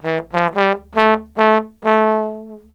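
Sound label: TROMBONE SOLO, BRASS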